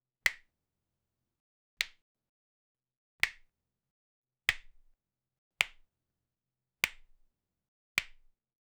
no reverb tail except a, leather

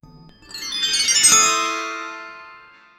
magical chimes that cresc

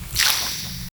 objects

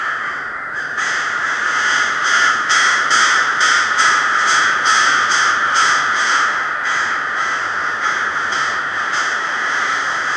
a dense forest and flock, crows

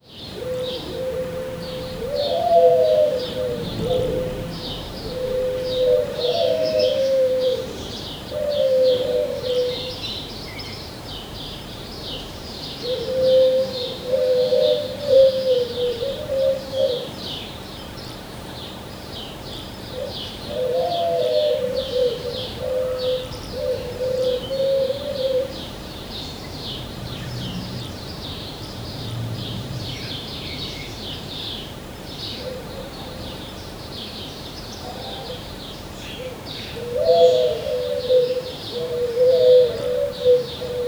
a peaceful village early, early morning

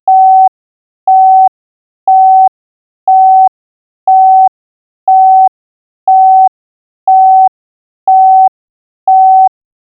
a short notification soun; robbery

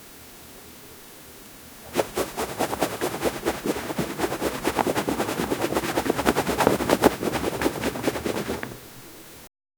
someone shaking leather

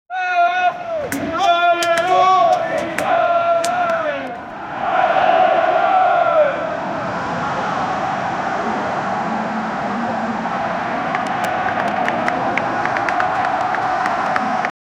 sounds of the street soun; amateur

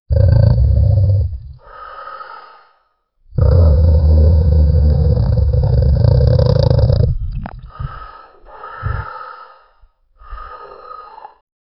the breath of a predatory

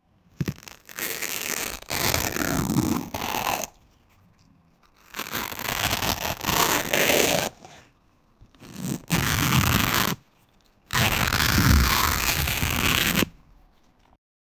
growth sounds with tearin

